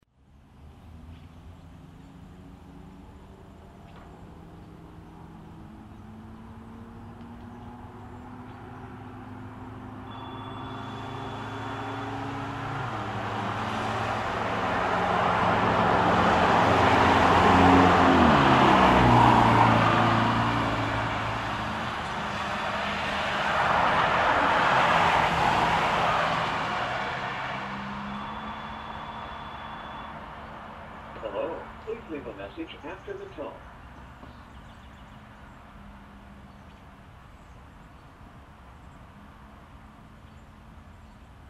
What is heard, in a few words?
Answering Machine